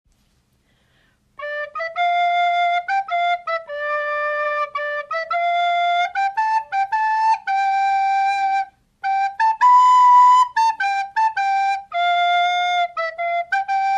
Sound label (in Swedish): Blockflöjt